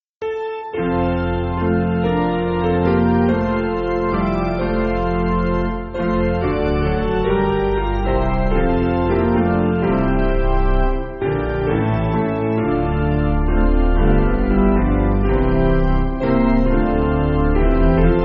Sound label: All Hail Ye Little Sarratt OPiano